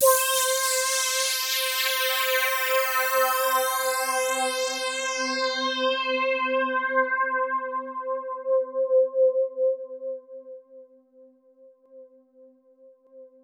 NL A4 C4, STRINGS; Music Store Sound Service; Einstein

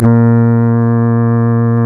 REED A#; Big Fish Audio; Synclav FM

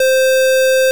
OB SQRLD C4, OBERHEIMS

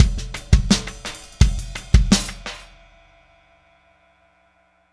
Static Beat Ending; Sonic Foundry (Sony Creative Software); Indie Rock Anthems; Session 13 - Static

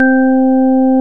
KURZ ORGAN C; Greytsounds VOL-1

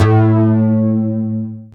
BASS, A2; Zero-G; Chemical Beats; BASSES

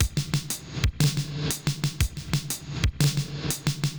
Spring Verb Compressed TR 505 120BPM

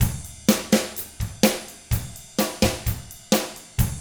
127 SURF ROCK

